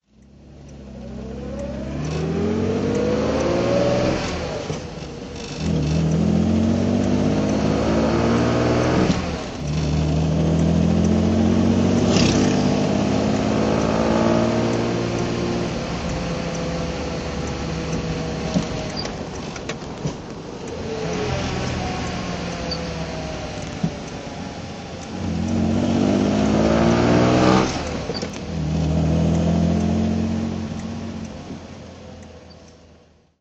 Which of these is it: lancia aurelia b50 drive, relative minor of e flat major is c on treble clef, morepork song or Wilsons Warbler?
lancia aurelia b50 drive